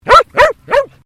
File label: Animal Dog Border Collie Female Bark Sequence; Various domestic dogs; Dogs (Sound Effects:Animals)